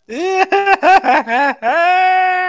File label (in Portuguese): risada